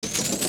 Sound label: Hanging Block Start; Shadow Temple; Zelda; OOT